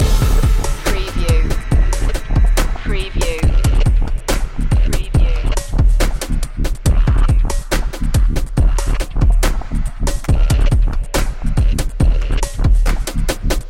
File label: under the surface loop